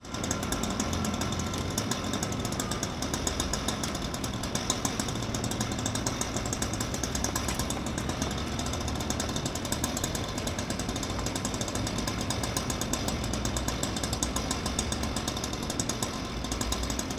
ambience road works town traffic pedestrians power saw idles cuts at 47 secs